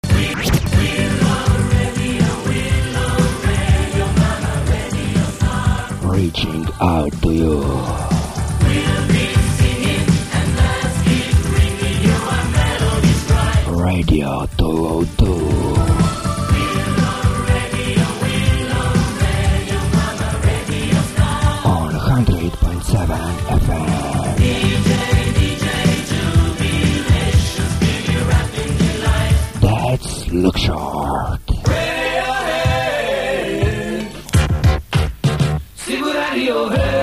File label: Jingle; We Love Radio